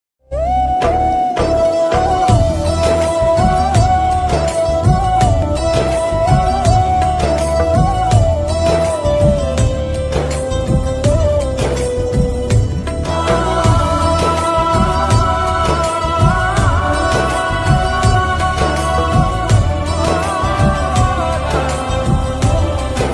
ost ringtone pakistani drama ringtones instrumental ringtones; fasiq; geo tv